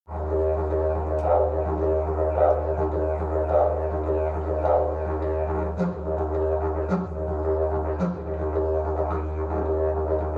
Tjapukai Didgerido

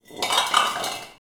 BANG, explosions gunshots

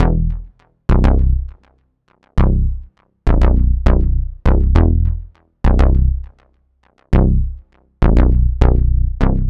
Big Synth Drama stabs C, Cinematic Trailer Loops

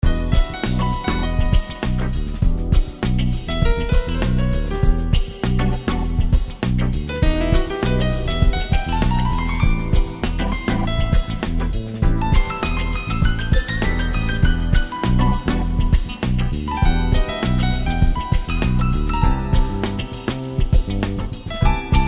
Into The Jazz, background pads

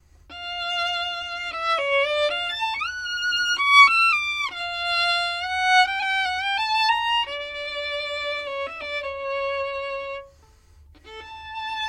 Violin

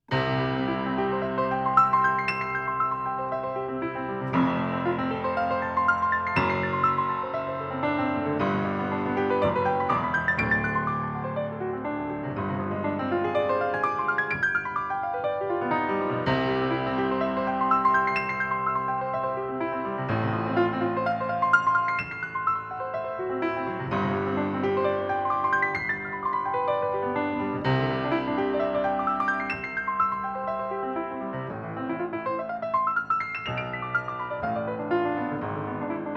Complex Instrument; Config 1

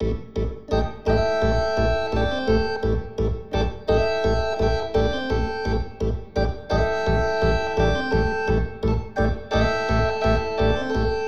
Blues Organ Hammond 3 A 85

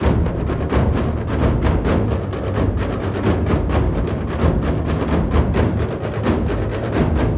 cinematic wild drums 130bpm

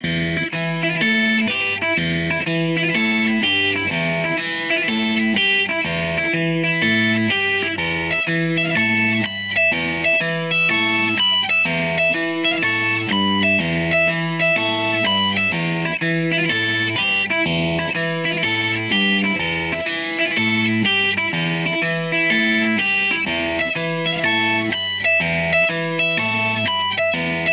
Blues Rhythm Guitar Am 124

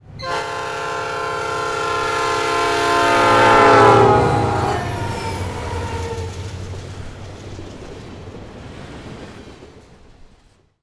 TRAIN diesel Horn; trains

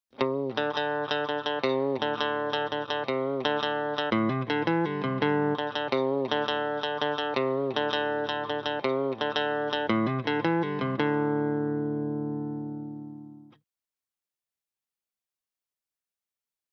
Electric Guitar Lead Fill; BMW Blues EWU

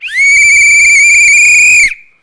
police Whistle; whistles Horns Bells

